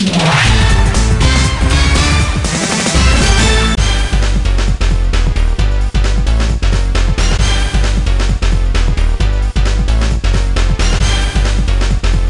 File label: Touchdown; nfl blitz 2000 dreamcast n64 ps1 gamerip 1999